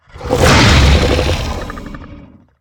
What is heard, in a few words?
Sniff; Evolve; Behemoth